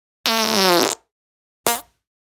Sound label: poop